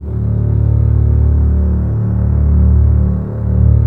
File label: Basses D 1, String Ensembles; Miroslav Vitous; LINKS ORCH